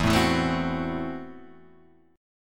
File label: F sharp Minor 7th Flat 5th E